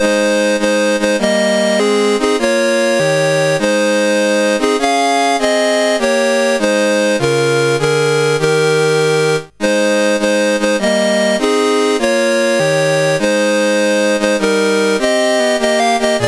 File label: Ghana, National Anthems, CSG 8580